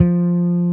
BAS; Best Service Gigapack 2

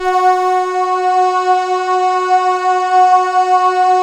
CRUMAR; Masterbits; Vintage Voltage

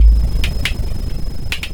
percussion loops 138; Techno ID

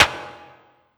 perc, Drum & Bass, 160 BPM

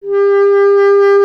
B. Clarinet

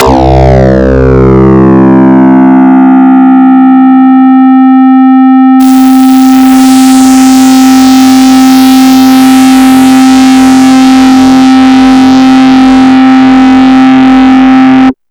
DIST STACK; Big Beat Samples & More; AKAI

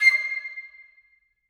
flute stac C7, Woodwinds; BBC

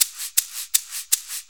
Shuffle Perc, Perc Loops; Sonic Foundry; Sony Creative Software; Loops & Samples Drum Tools; Shuffles

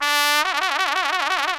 THE TRUMPET

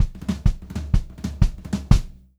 FILL, Pop-Rock Drumloops; USB Soundscan vol.37; AKAI; 90 s Sample CDs

